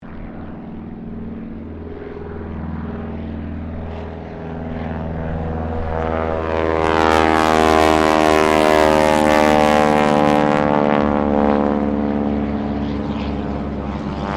AIRCRAFT BOEING PT-17 STEARMAN TAKEOFF CT4 TAKEOFF; 1939; PT-17 Stearman Trainer; Airplanes; Vehicles